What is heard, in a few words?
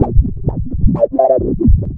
127 FRESH; USB Soundscan vol.20; Fresh Disco House I; AKAI; DISCO ADD